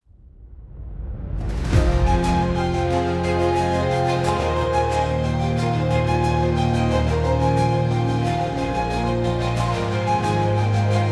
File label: dramatic music generic